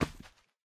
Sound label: stone impact; jewels